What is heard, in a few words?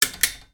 Stapler